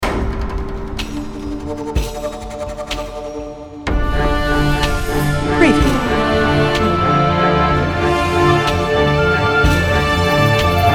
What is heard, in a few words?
Cinematic Exotic Amazon Forest Action Chasing Animals World Music